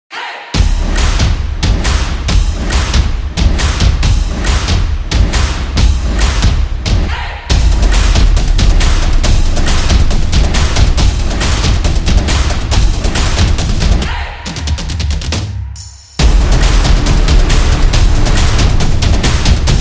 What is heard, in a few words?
Sports Music, sport drums